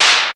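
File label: analog perc; 90 sSampleCDs; Crypton - DrumBase5000; HARD PERCS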